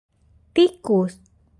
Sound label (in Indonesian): tikus